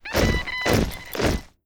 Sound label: unable manpower alert; Hearts of Iron IV Death or Dishonor